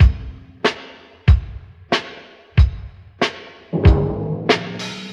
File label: whistler, Boombap Drum loops